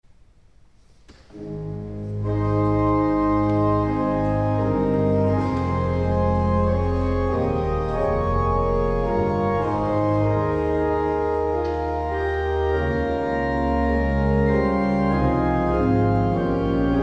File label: Organ